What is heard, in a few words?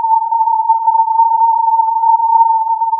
filtered input